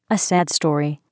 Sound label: sad story